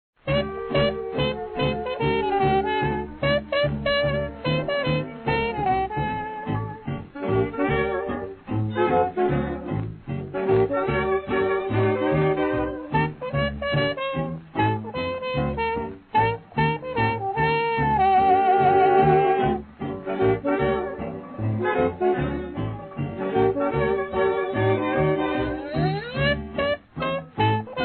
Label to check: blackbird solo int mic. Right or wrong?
Wrong